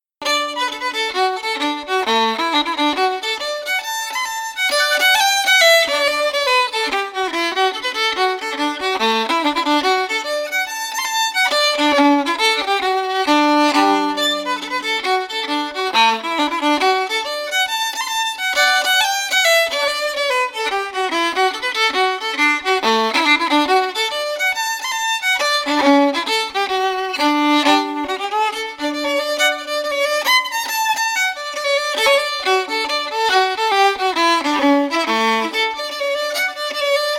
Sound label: fiddle; flowers of spring